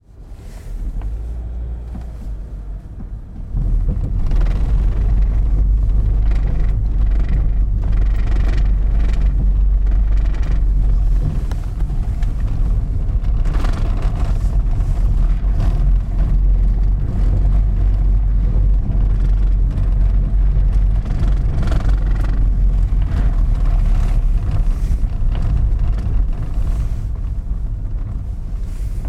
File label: transport car drive on cobbled road